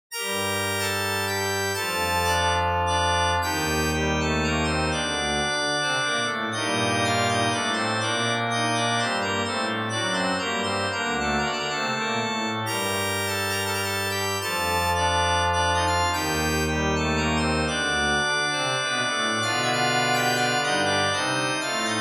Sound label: Church Organ G, Minuetto in g minor, reverberated; Andreas Instrument Sounds AB; Church Organ G - Reverberated Demo